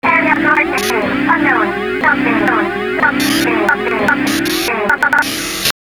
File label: Warning